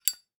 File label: zippo open; nature